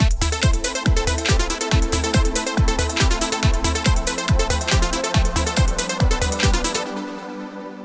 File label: drumy